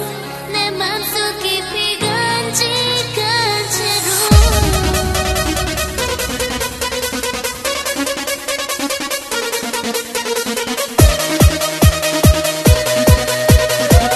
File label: Korean Techno Song